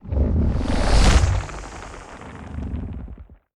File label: Puffer